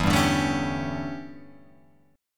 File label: E Minor 13th guitar chord, Open F; Em13 strummed; JGuitar